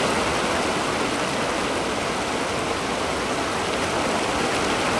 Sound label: sewer stream; Golden Crayon Sounds